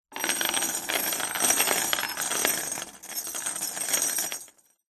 industrial chain medium sized lay down coil on external wood floor